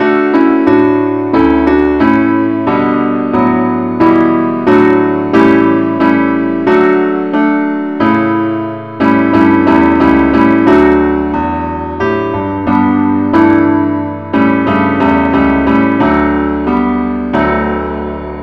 keyboard Only key d; Welsh tune; Gwalchmai is a Welsh tune, named for the town of Gwalchmai

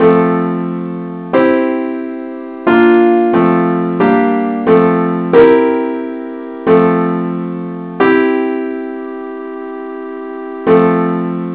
simple Acc key f

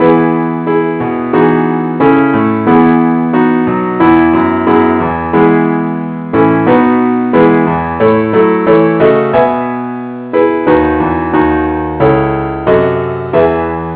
keyboard only, key e; christe Sanctorum

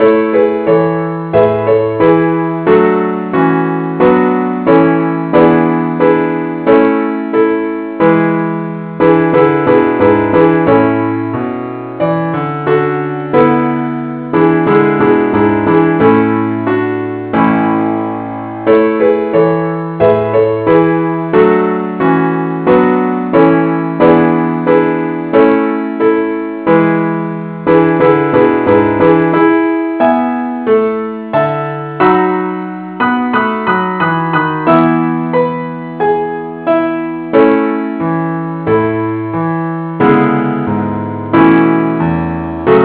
keyboard Only key a, a Welsh Folk Tune

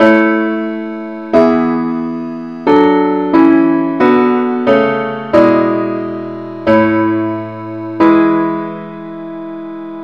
parts only key a

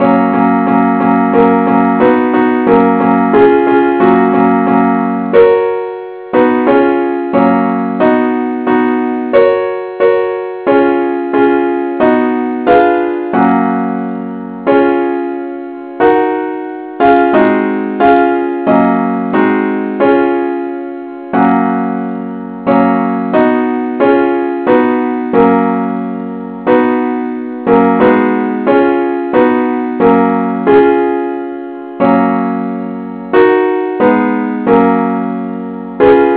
christe Sanctorum simple acc key ges

